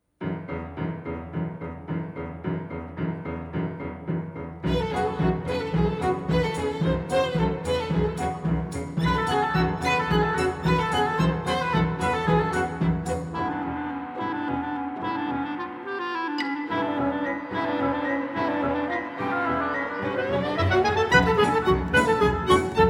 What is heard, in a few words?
instrumental